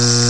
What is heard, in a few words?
bee